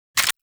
Akimbo Pistol Mech; Grineer; Weapons

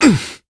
Pain, Adult Operator; New War

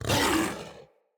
Kubrow Feral Vocal Angry Attack Short

